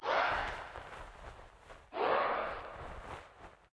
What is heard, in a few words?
harpyslave idle, monster